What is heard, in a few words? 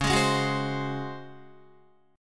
Db Major 7th Suspended 4th Db down Guitar; strummed; JGuitar